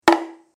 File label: paranku